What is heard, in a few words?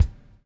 BUBBLE; Spectrasonics - Backbeat